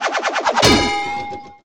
Pickup Special Star

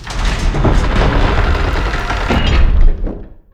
Door Large Wood Open; Doors

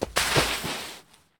Baseball Dive Dirt

